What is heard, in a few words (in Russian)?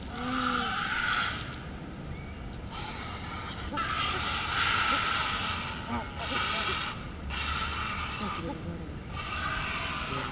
seraya caplya golos